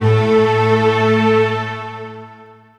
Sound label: Big strings A2